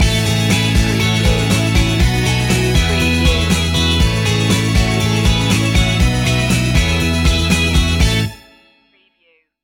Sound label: stinger